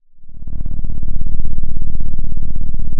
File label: Sound of an engine revving